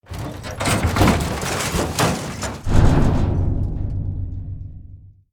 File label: explosive objects; World of Tanks